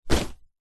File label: foley clothes throw into suitcase; zapsplat